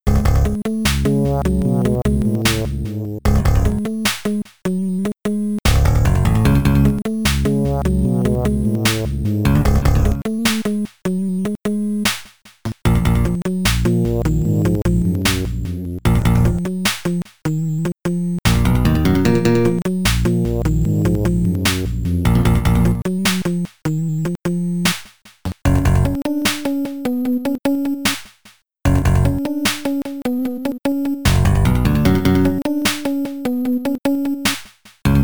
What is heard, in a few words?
Lose; ufo enemy unknown amiga